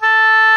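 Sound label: A4 soft straight, oboe; Northstar - New Gold VOL-2; EBONY OBOE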